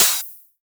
Open HH, Cymbals

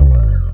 Single Acoustic Basses

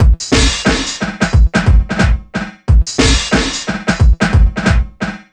delay infecter 090bpm; Zero-G; Downbeat Dance Grooves

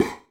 Puh tooy, Alesis D4, Efx

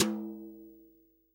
TOM, REAL DRUMS, SWING KIT; Best Service ProSamples vol.43; Real Drum Kits

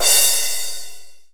80s Crash

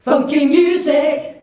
FUNKY MU D, FEMALES

